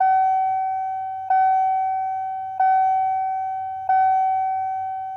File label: Mini Van Door Alarm; Blastwave FX